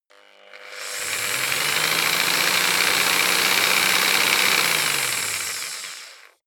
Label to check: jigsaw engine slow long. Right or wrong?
Right